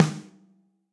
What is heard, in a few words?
Snare Hit & Rim Warm And Low; Drum Hits; Ableton; Factory Packs; Session Drums Club; MPE Hits